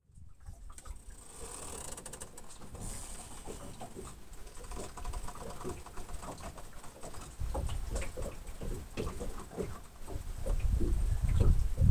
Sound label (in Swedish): Styv kuling